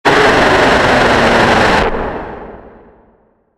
capuchin jumpscare; dave

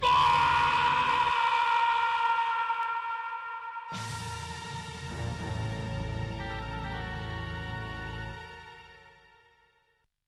death scream

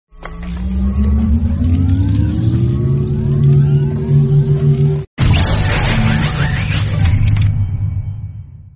reboot van sound effect

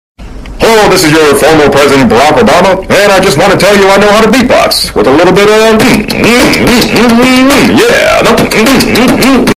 beatbox; obunga

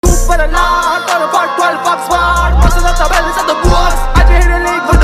indian song viral

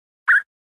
chirp parrot

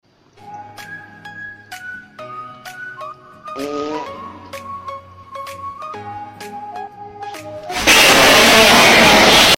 fart

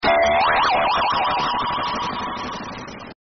gravity coiling; roblox

